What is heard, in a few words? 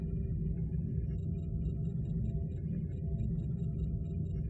stage, ambiances; CosmicRage